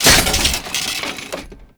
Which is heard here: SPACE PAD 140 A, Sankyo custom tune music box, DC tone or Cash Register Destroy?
Cash Register Destroy